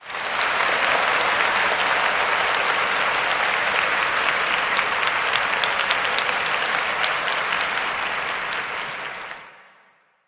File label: cheer